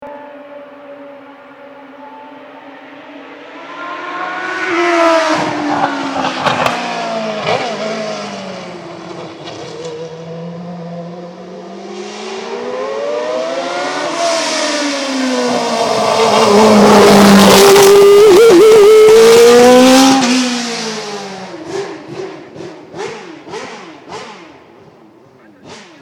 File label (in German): Formel vor Ziel; Altbueron 2011